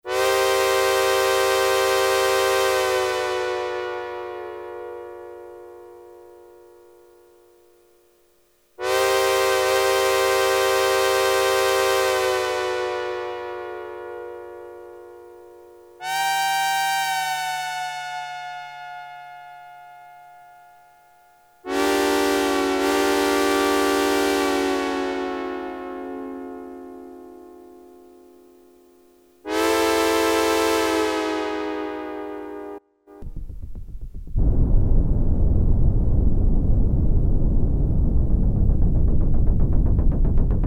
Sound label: TRAIN HORN, Roland Jupiter-8, Factory patches